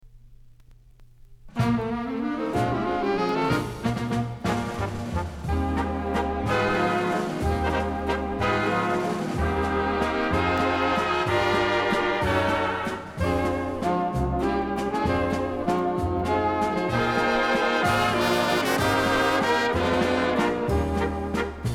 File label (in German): tanzorchester, valse